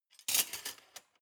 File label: cutlery large multiple drawer place soft